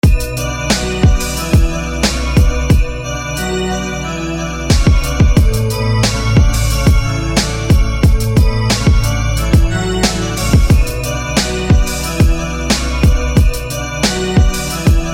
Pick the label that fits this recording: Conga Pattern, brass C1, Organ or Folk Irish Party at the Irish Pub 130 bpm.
Organ